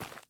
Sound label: Footstep Dirt